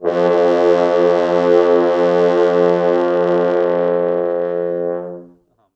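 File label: 4 FrHorns SUS, French Horns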